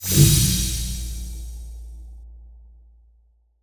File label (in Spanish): Activa Efecto; TRORB